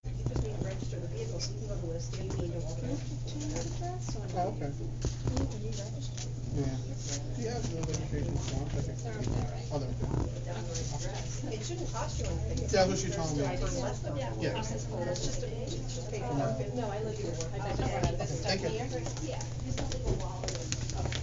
dmv background noise

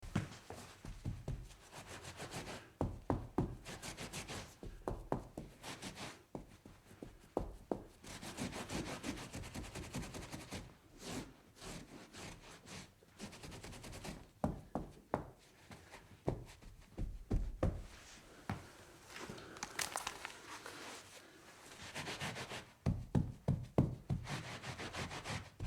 clean up spill

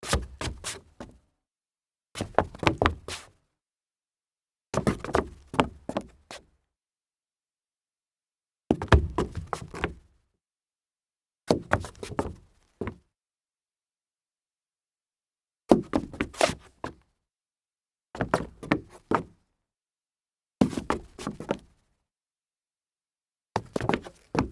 dress shoe stumble wood

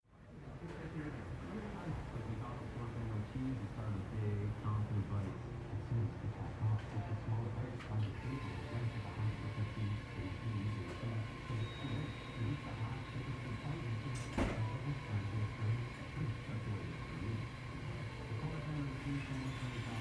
laundromat sounds

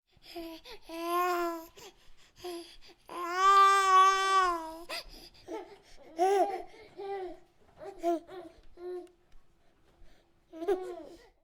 babies coo

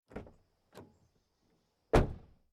opening closing car door far

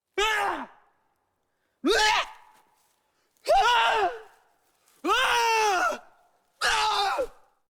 death impact yell single

